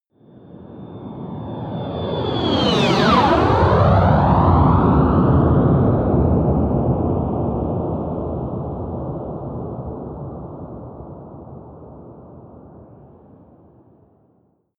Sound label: military jet bomber flyby whoosh